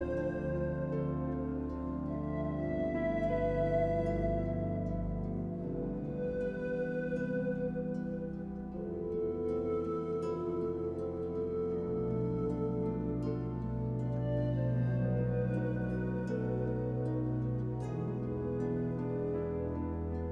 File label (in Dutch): Ave Maria, panfluit instrumentaal; Caccini